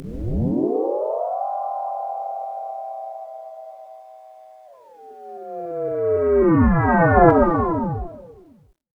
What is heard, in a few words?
laser